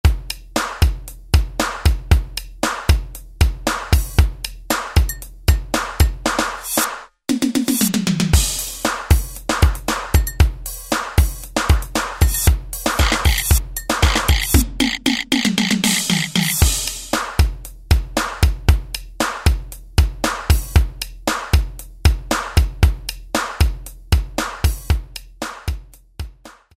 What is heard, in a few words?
Electro, Alesis SR-16